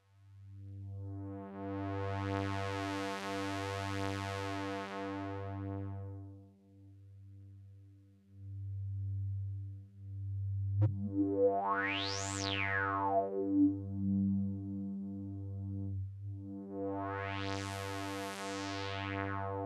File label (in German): SEM OB E Oszillatoren; Doepfer